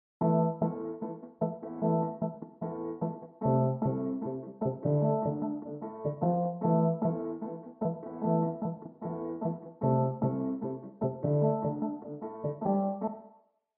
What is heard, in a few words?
Motors; Umlaut Audio